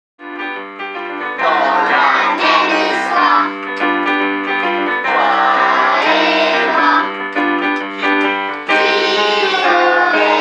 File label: Vocal